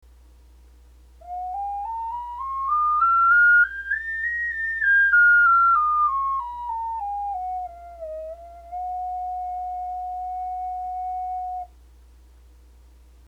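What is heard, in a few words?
ocarina scale; オカリナ; Ａオカリナのソノグラムと録音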